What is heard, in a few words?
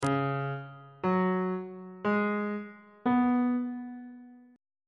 c sharp dominant 7th suspended 4th chord intervals; bass clef